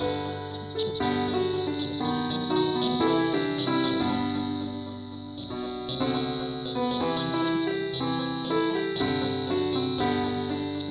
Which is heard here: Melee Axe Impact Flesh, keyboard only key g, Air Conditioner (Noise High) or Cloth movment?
keyboard only key g